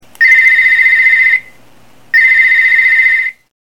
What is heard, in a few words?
cellphone